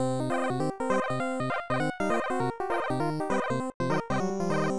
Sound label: Funky chip; Jaguar XJ220; Amiga